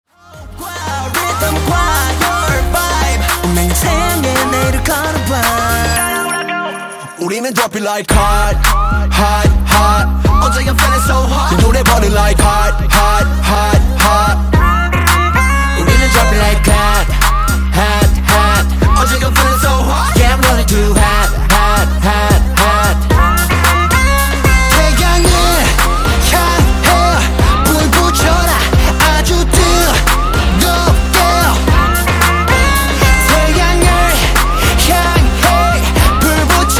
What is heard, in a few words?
Dirty 112 Bpm; Redrum